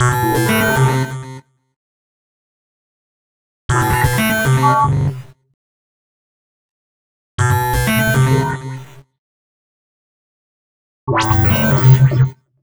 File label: Noisy Gate, Synths; Sonic Foundry (Sony Creative Software); Modular Electronica